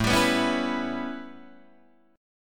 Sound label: A 9th A, Guitar Open D